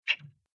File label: one step; kritter krawler